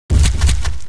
chimera run

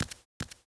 Run

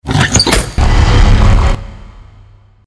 Present Box open

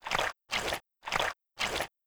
Snail Walk

